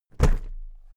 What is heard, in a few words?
briefcase plastic drop soft; industrie wirtschaft; bueroarbeit